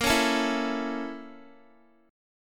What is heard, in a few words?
Bb Minor Major 7th Flat 5th, Guitar; strummed; 4F,4A,5C,5G,5C,6E; x,13,13,14,16,x